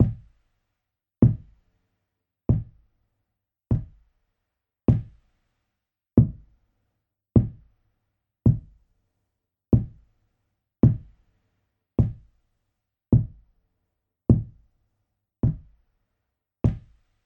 Footsteps

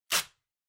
Kraft Tape Peel Off

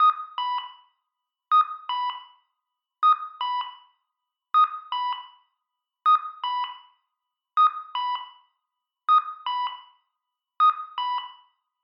Crosswalk Signal Loop

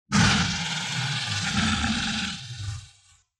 Monster

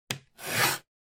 Cutter Cut Paper

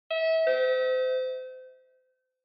Ticket Gate Alarm Timbre1 Far

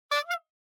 Bicycle Horn

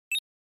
NES Shooter Score

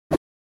Fighting Swing

